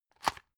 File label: drug open hard; arzt